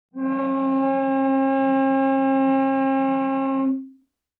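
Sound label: Cello arco fortissimo sul C C2; MIS Pitches - 2014; Strings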